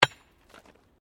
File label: Digging Pick Concrete